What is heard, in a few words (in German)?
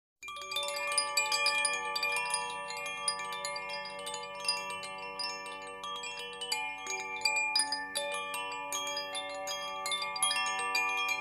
klangspiel zaphir crystalide